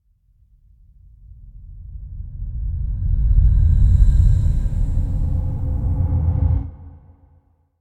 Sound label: Drone Low Deep Oncoming; Hollywood Edge; sound designer's toolkit v2